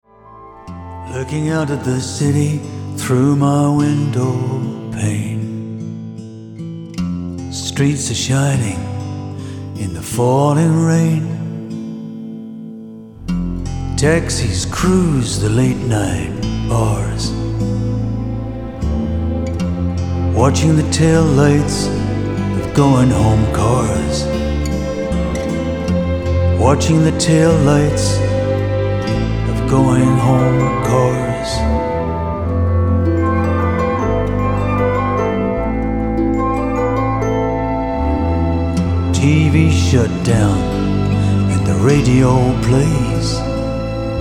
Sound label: chimes